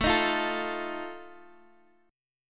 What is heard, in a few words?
D sharp Suspended 2nd Flat 5th, chord